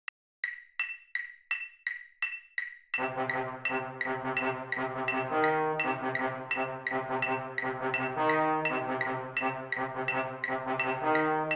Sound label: baritones, marching band